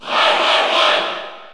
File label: Cheer French; Roy Koopa; SSBU